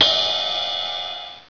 Metal Ping; psion cd vol1

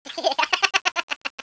Mouse Laugh

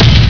Drum Stroke; psion cd vol1

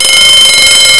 Alarm Clock

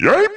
YIPE; psion cd vol1

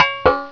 CLANK

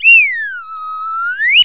PIPER 2

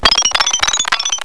Broken Glass Melody

